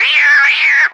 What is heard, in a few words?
cat fight; Animals